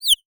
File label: chick